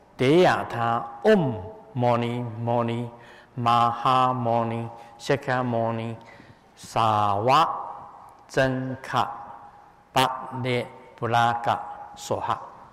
Pindola Bharadvaja Mantra

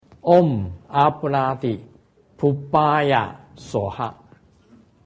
Smoke Offering Mantra

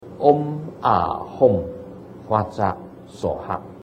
Nairatmya mantra

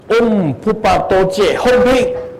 Dorje Phurba Short Mantra

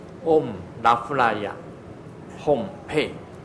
Rahula Name Mantra

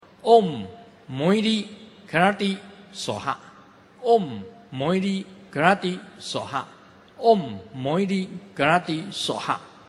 Mahamayuri mantra

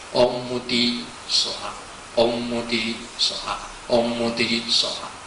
Food Bestowing Mantra

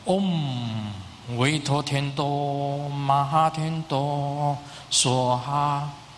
Skanda Bodhisattva mantra

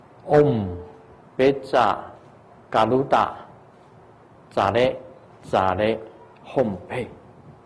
Garuda Mantra

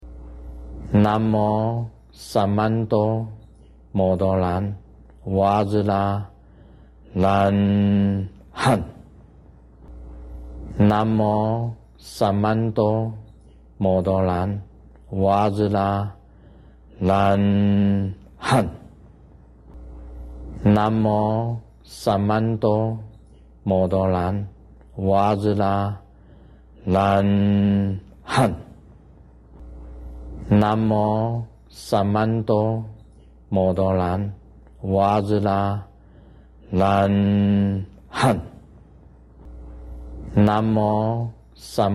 acala short mantra

